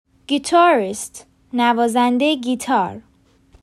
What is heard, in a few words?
Guitarist